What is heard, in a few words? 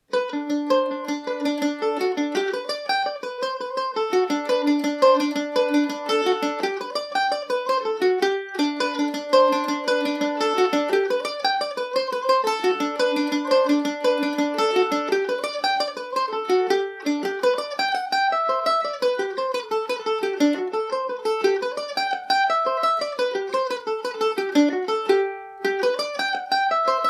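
G major, irish mandolin; firefly revisited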